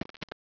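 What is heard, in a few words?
dirt, footstep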